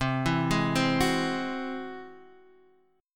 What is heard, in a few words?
G sharp Augmented 7th C